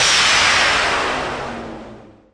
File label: Electro FX; Ueb HE Electro FX